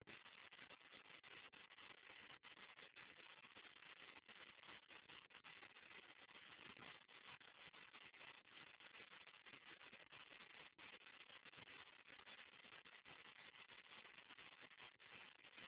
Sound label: flamingo; Erie Zoo; Erie Zoo Children's 1993